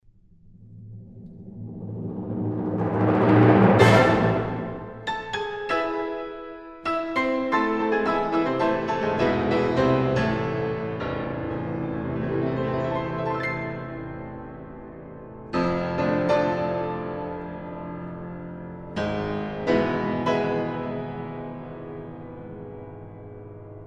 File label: Grieg Piano Concerto 1st Mvt